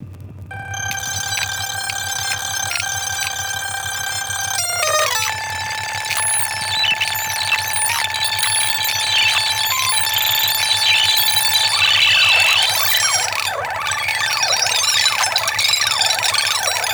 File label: scream